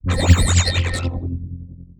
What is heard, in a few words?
Teleport